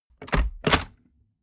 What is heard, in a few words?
mechanics small movement